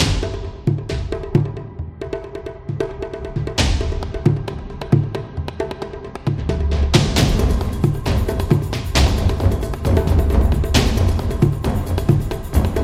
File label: taiko drum